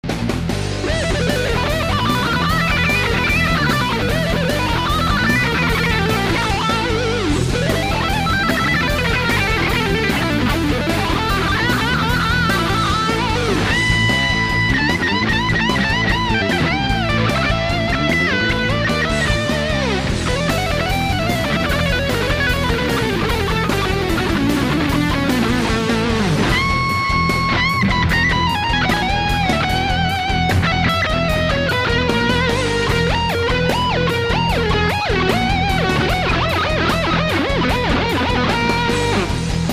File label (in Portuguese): solo de uma jam; jam do orkut